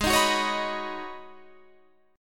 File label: Ab Minor 11th, Ab, guitar chord